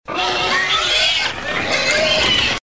pigs squealing

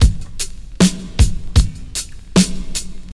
77 bpm drum loop sample f sharp key

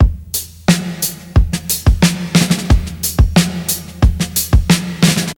90 bpm breakbeat sample d sharp key

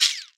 Dry Shaker Hi Hat D#; percussion

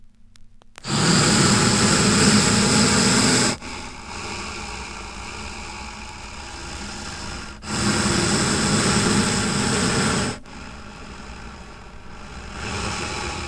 heavy breathing; dracula breathing

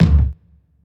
roomy kick drum sound d key

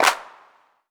clap sound clip e key; percussion; single hits one shots

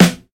thick snare single hit f key; snare drum samples

